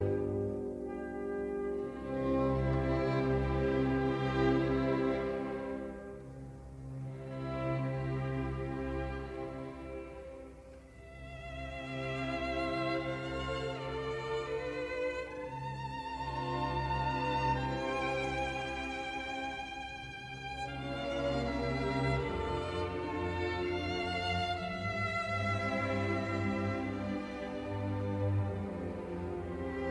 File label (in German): konzert fuer violine und orchester e moll